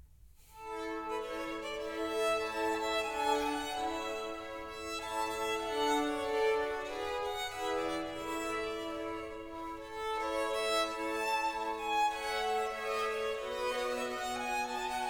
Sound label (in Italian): Concerto grosso per due Oboi, Fagotto, due Corni, Violino, Archi e Organo in F-Dur; Concerti Grossi; Antonio Vivaldi